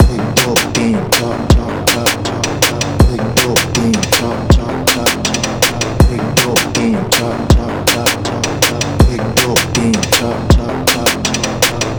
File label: Drum Loop 160 BPM; phonk; Gully Loops; Ek Do; Aatma; atma phonk sample pack